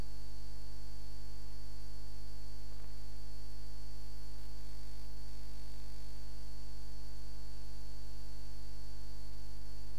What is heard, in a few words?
cable